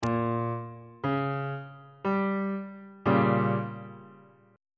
f double sharp diminished 1st inversion on bass clef